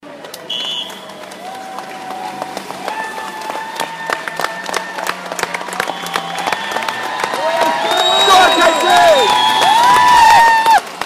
what does a Glasgow sport support crowd sound like